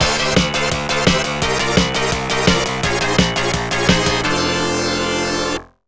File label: Unused Jingle; U.S. Championship V'Ball; X68000; U.S. Championship Beach Volley